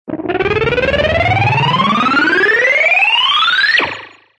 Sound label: siren